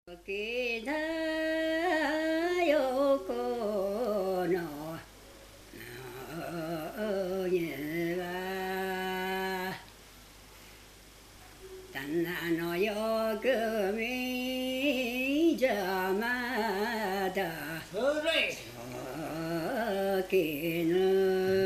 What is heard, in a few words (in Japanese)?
mugitukiuta, minyou